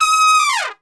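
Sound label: D#4 TRP SHF, Trumpet; Best Service; Brass Super Section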